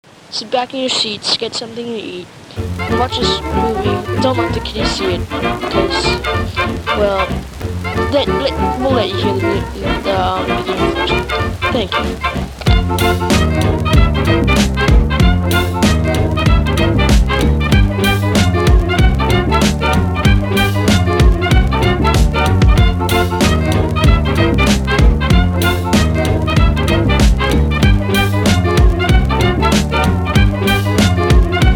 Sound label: blues